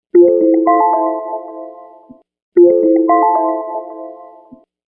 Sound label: Timer Down Notice